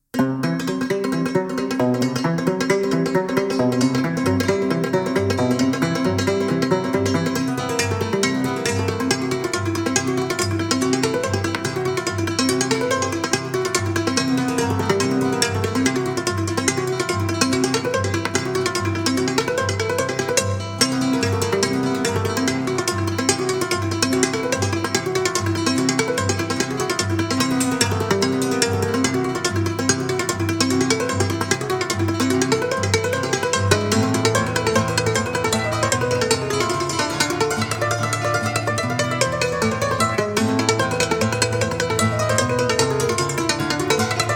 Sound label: Gypsy Tune